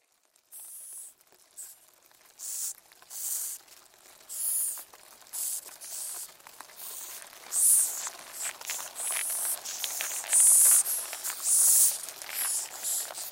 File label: Insects Larva Hive, Insects Larva Hive Movement Bugs Hisses Cockroaches; Animal; Insect Sound Effects